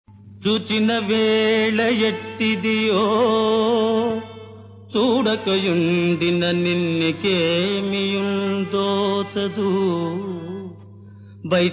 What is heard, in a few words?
Padyam; Chintamani